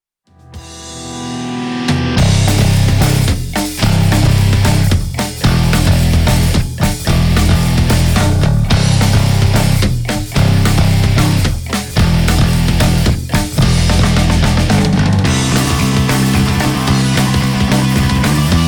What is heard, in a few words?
Pick Alusonic Custom Shop J Special Deluxe 5